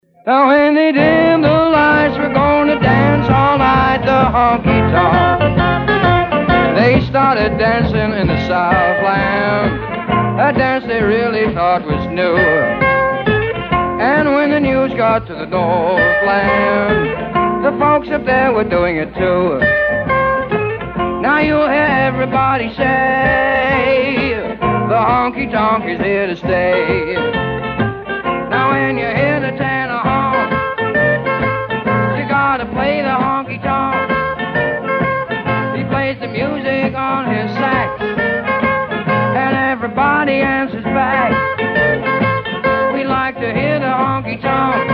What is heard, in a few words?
honky tonk